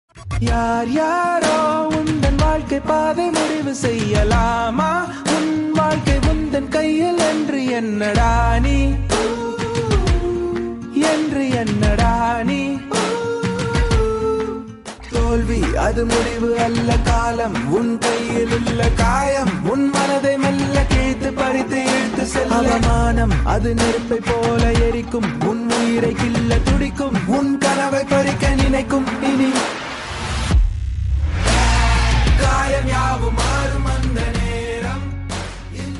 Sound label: Tamil Version Ringtone